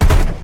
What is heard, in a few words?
gun; mcheli